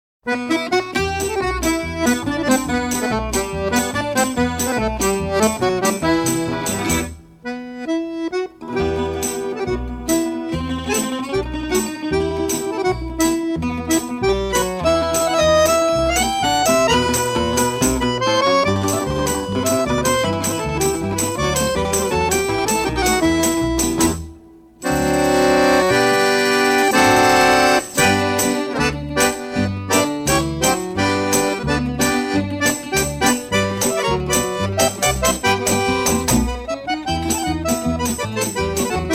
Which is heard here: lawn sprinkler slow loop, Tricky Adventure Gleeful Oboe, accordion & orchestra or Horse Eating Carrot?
accordion & orchestra